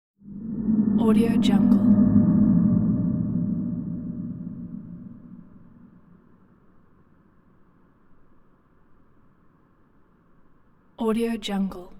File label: monster cries inside a cave; hollywoodedge